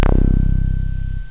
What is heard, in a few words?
Synth Bass 4